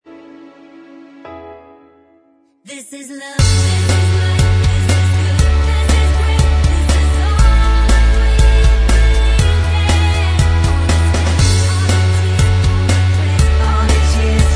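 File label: backing vocals track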